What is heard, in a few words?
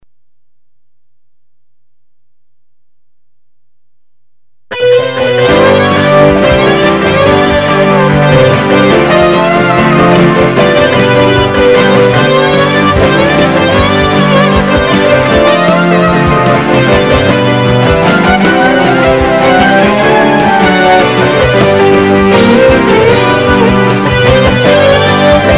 DGX Strings